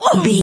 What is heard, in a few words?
voice female hurt pain